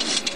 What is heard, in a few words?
trim, 737-800